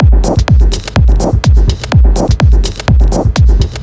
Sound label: 125 drum loops; Undergrowth; M8; Electronisounds